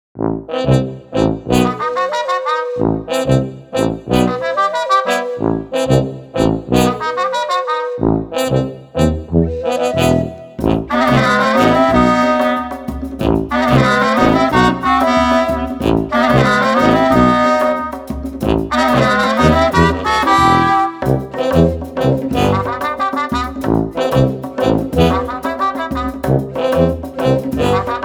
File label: Balkan Brass; Narrative Mind